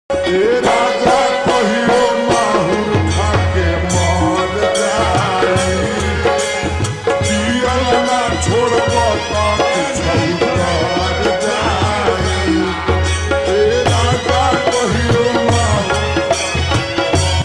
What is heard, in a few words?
Ringtone slowed and reverb